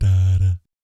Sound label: "BS DA DAT", vocal jazz fx